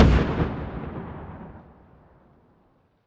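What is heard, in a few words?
Stalker Nuke Destruct Explosion Distant; Titanfall 2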